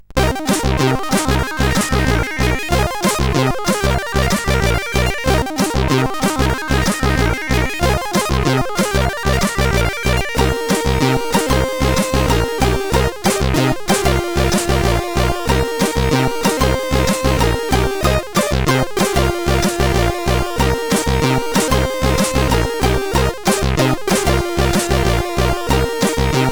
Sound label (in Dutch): Muziek, CSG8580R5